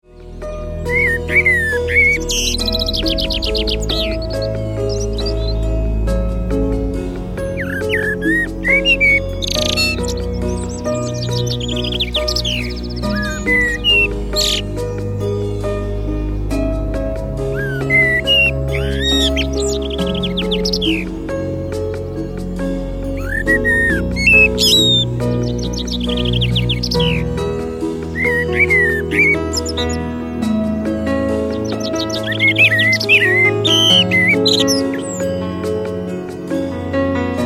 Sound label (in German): Entspannungsmusik